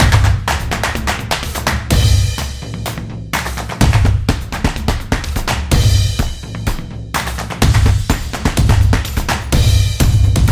Teamwork, sport drums

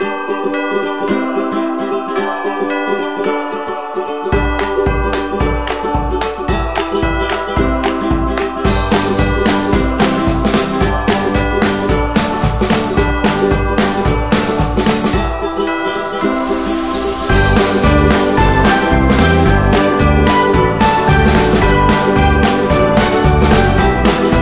Xmas Upbeat

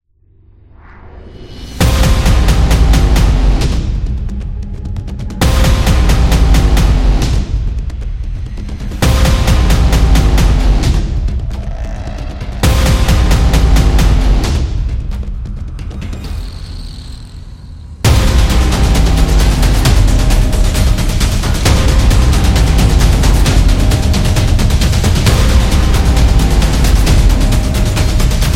Trailer Build Up; war drums

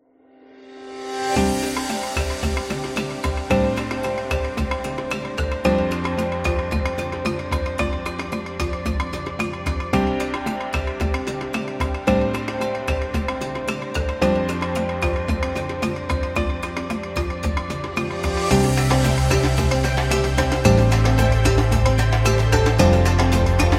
Happy Africa; sounds of the world

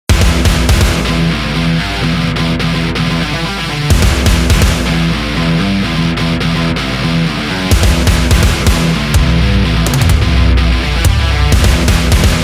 This Is Hard Rock

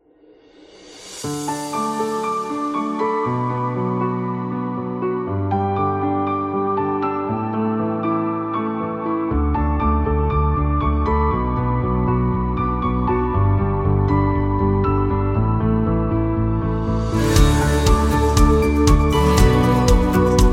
Piano Romantic, corporate music; corporate music vol 14

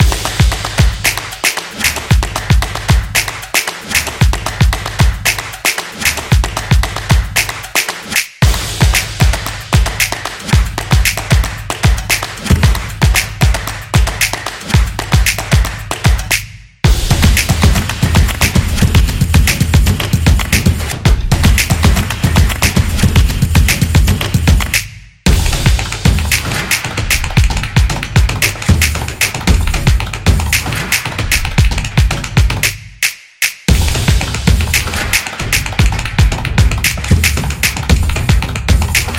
Abstract Beat; sports

